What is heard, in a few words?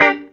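guitar chord G minor, roots reggae; Sounds Good - Roots Reggae; GTR CHD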